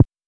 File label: perc